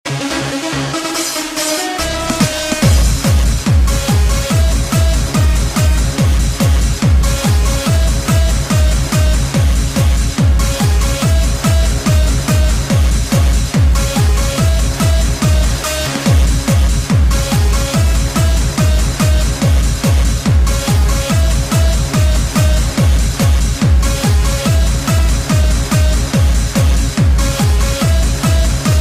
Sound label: Hardstyle